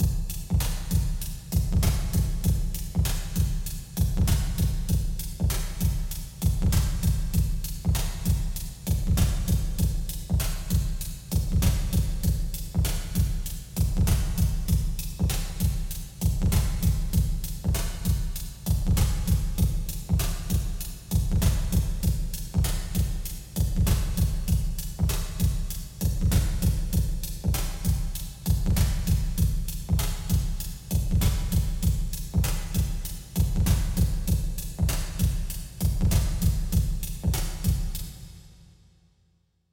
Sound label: Drums SP2016 Stereo Vintage; Eventide Plugin